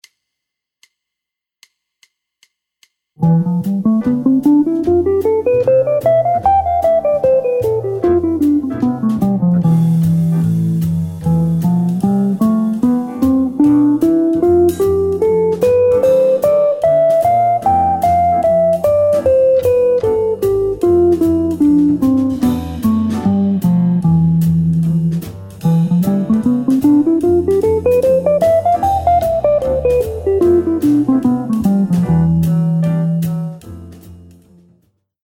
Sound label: phrygian guitar mode